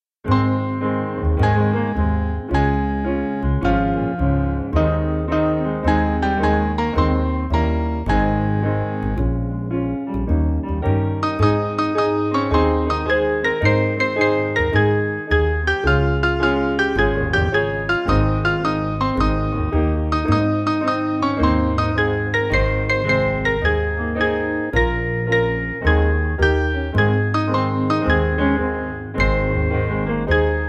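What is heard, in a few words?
The Circuit Riding American Melody Piano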